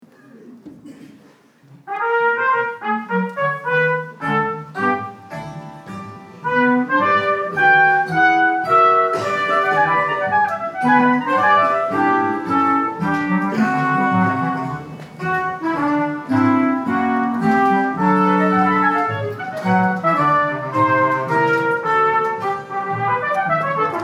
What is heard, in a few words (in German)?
Marsch der Soldaten; Soldat Teufel